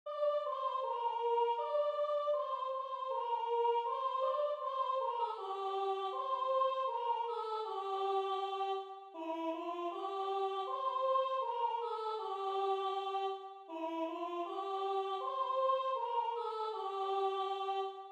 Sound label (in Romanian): Sculati sculati boieri mari, Basarabia, colinde